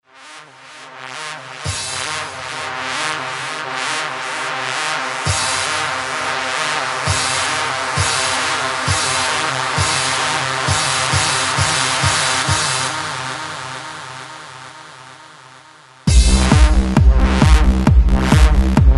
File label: Old School Techno